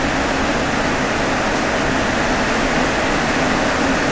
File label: rain